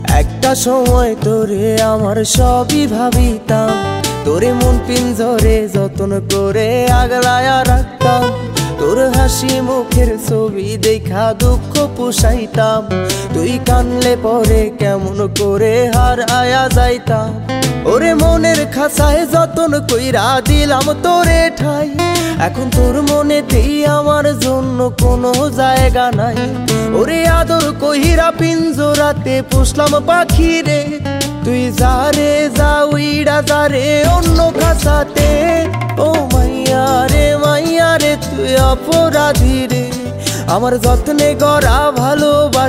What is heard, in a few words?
Bengali Song Ringtone